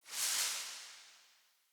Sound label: Talisman Grace Burst; Cosmetics; Talismans; Founders Talisman